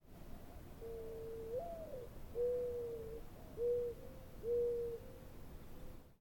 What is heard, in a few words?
Mourning Dove Zenaida macroura; birds